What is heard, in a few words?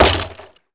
wood damage